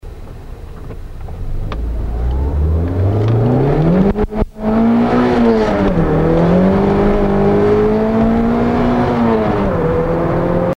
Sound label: smokin, exhaust